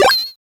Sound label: Pickup